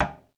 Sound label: SURDO RIM; World Combo